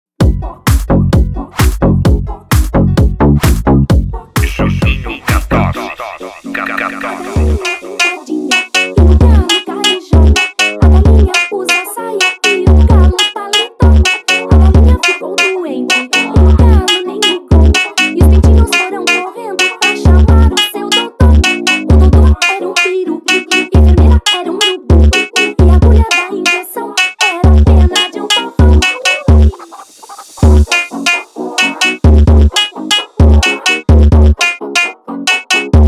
funk remix; galinha pintadinha

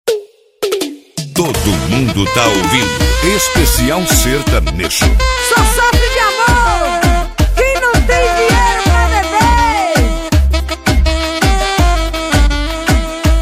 sertanejo com locutores de rodeio

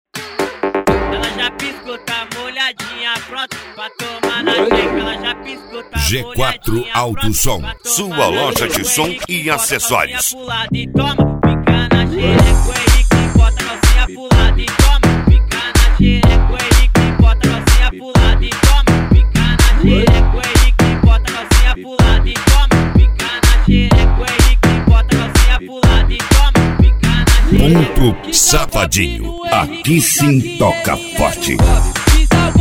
mega funk

